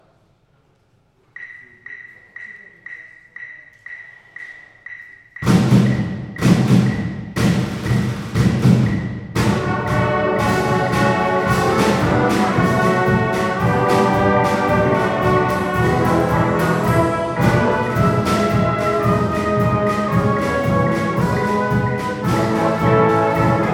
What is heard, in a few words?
Jingle Bells, brass; North Star Brass